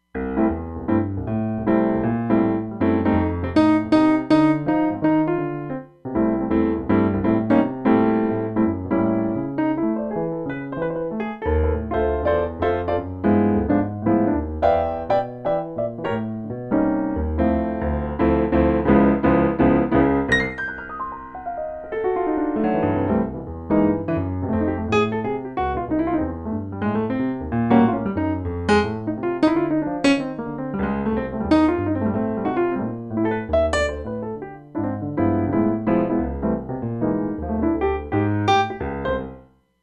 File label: Dry Studio 88